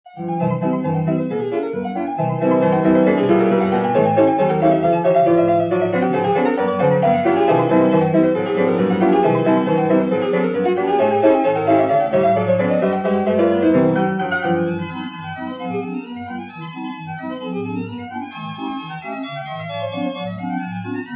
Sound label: wurlitzer organette, brown skin rag; WURLITZER STYLE YW ORGANETTE